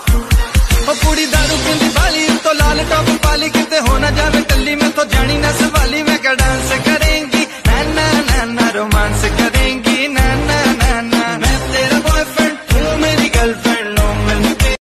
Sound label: famous ringtone, bollywood ringtones